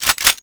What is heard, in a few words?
reload shotgun; ufoai; weapons